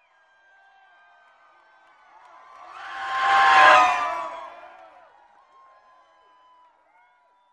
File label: Arena Crowd Cheer; sports arena